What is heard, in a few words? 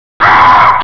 chicken scream